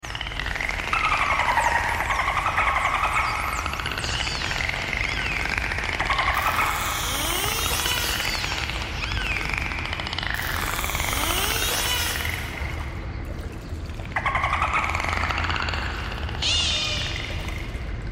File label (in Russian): delfin